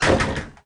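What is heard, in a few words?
front door close hit, volvo 9900; Freyfurt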